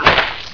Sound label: fall; quake2; ontra